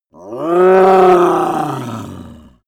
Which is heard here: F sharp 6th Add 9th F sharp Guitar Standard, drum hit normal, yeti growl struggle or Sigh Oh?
yeti growl struggle